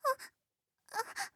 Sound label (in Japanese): "あ…あ…", クール; ハイグレボイス集